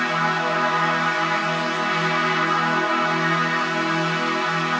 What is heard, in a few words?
Pad Loop E, shimmer and sparkle